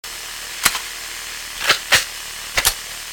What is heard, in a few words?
reload, weapons; thanatos3